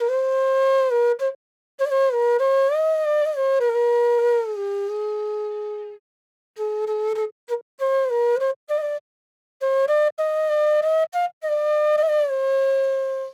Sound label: 90s Flute Loop 100 BPM A; Gully Loops; flute melody bundle